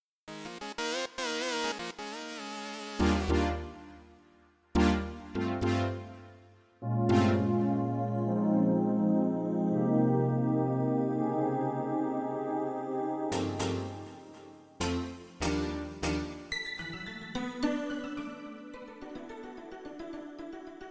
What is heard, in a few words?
Synth